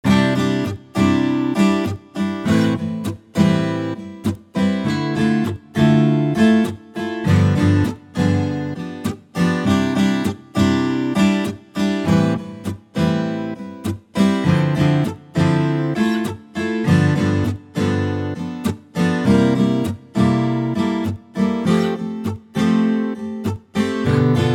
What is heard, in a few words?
realtime playing the strum